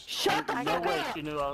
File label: ninja screem